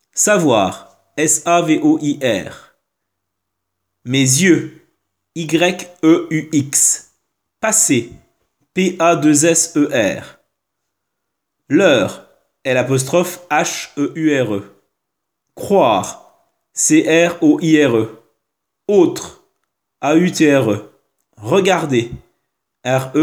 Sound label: Vocal